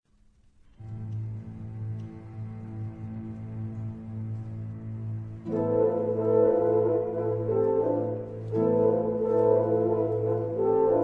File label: concerto for violin and orchestra